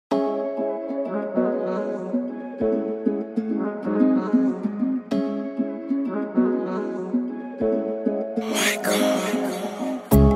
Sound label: INSTRUMENTAL